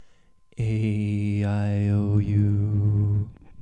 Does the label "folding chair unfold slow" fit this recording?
No